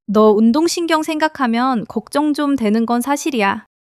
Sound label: laughter